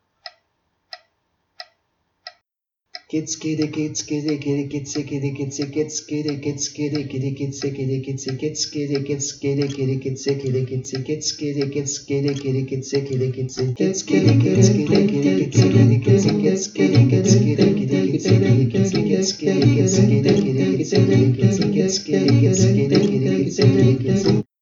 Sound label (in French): Batucada vocale; ganza